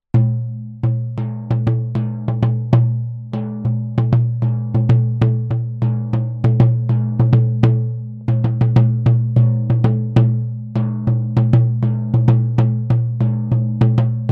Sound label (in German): schamanen trommel ziegenhaut 50cm